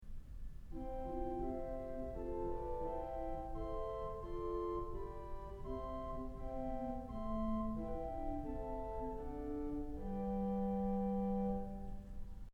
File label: Flute; Kellogg Auditorium